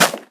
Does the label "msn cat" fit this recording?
No